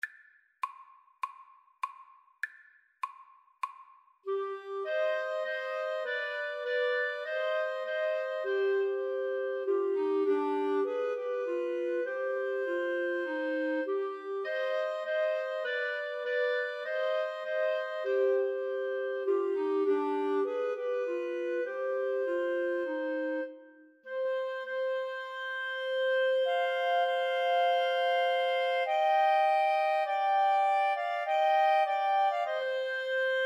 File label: Clarinet Trio